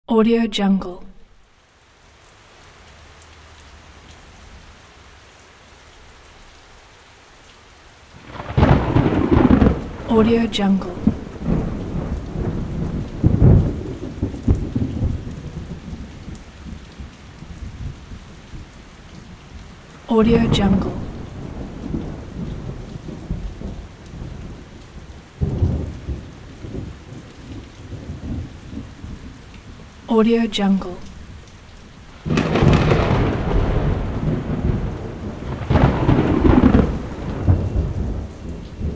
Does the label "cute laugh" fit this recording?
No